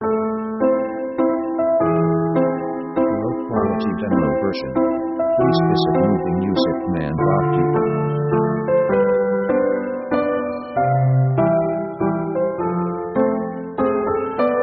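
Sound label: orchestral french walz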